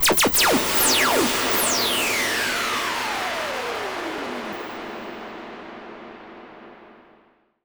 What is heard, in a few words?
Virus Noise